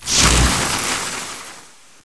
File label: explode; cstrike; DEX